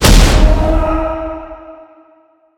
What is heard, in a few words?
impact kill; smite; scylla